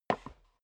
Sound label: step boots wood creak single stair down; schritte; Footsteps